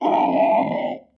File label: dinosaur grunt